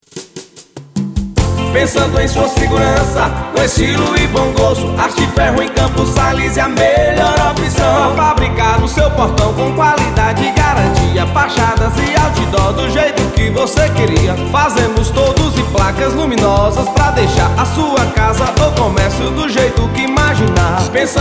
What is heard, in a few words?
JINGLE; ART FERRO